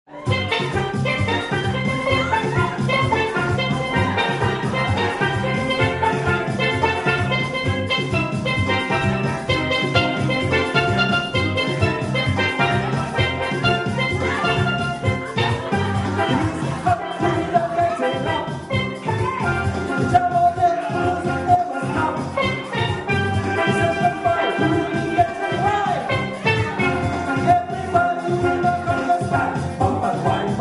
Conga Line, Steel Drum Band

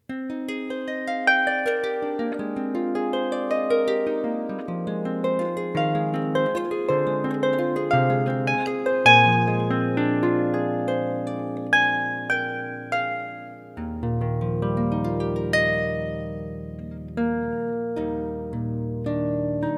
Classical Soft Sound, harp

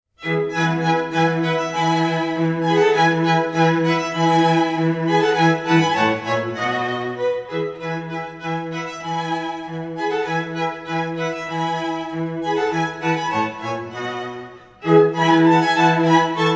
String Quartet; Spring; Vivaldi

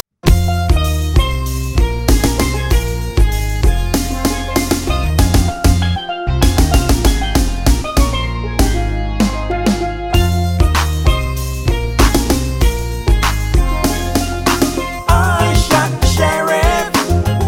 Vocals, steel drum

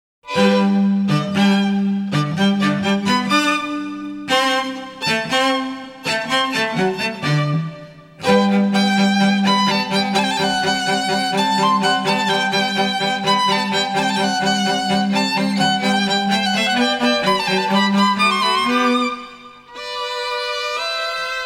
String Trio; Violin

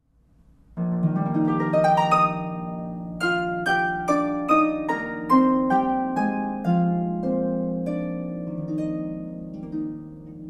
Harp; muzettas waltz

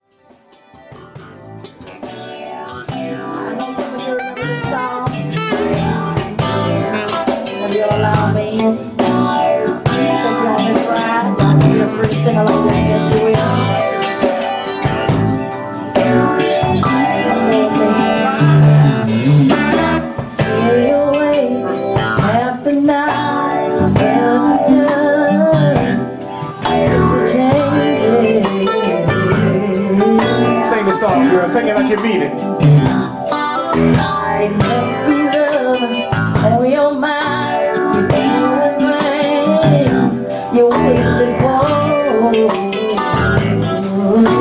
Ballad, jazz band

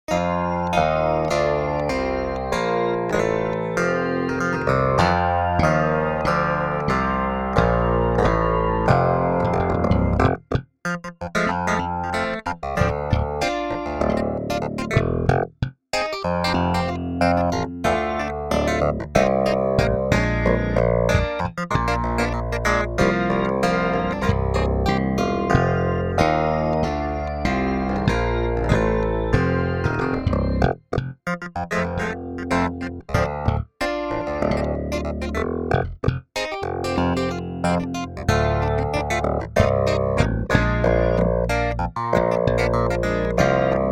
Rock Piano

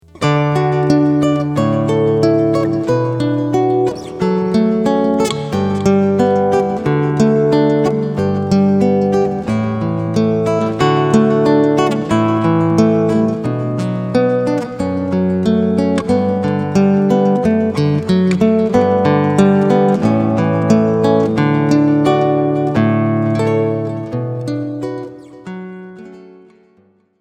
Canon D, Acoustic Guitar; Pachebel

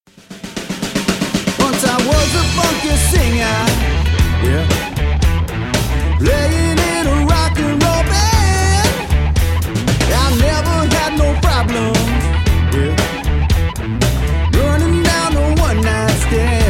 Rock Band

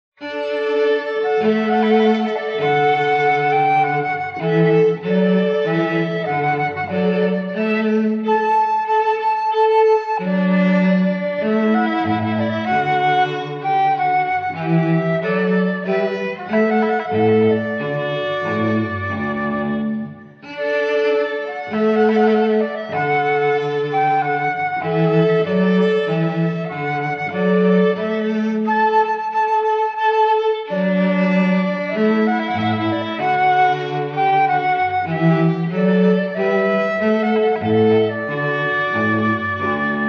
Trumpet Voluntary; chamber-music-string-quar; Purcell; Katherines Concert Associates

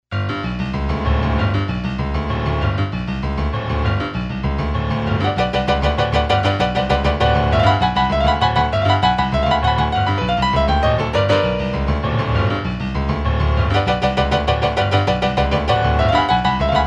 Pianist; Pop; Sabre Dance

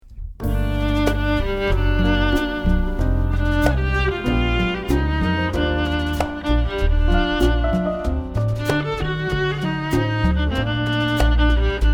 Jazz Quartet; Violin; Serenata

